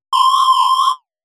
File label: shreek, Synth